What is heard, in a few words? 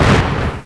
bang